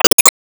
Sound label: Bounce Ball Hit; gamerip